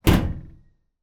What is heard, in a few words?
household door metal meter cupboard external close; zapsplat